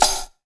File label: AX MIX PC; AKAI S6000 CD-ROM - Volume 1; DRUM RYTHM